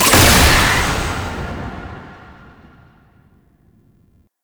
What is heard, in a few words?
Wrist Gun Alt Shot; Weapons; Warframe; Tenno